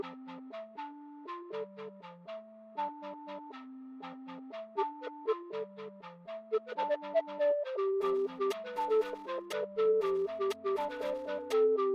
Music Game; Game rip; MiSide

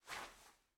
Item Hand Move; Game rip; MiSide

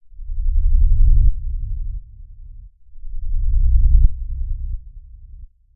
Ambient Horror; Game rip; MiSide

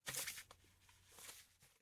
Paper Take; Game rip; MiSide

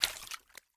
Step Water; Game rip; MiSide

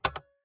Switch Close; MiSide

